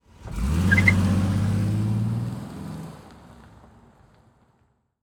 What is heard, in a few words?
Transportation Tire Squeal Small